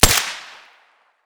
uzi suppressed fire

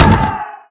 cannonballs ammo; serioussam2

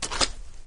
walk, flood

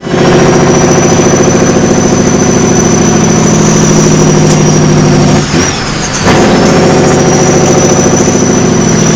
throttle off, vehicles; tdmcars; kwt800

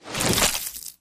human form short move; flood

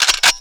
bullets ammo; serioussam2

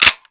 mp44 bolt forward; weapons

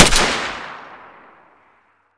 sks suppressed fire

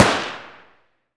mp5k distance fire

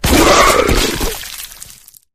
flesh destroyed large; flood

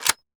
m24 bolt back, weapons

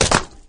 jump, flood

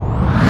grenade launcher charge; weapons

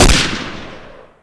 m1 carbine shoot; weapons

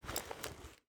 generic cloth movement, weapons handling

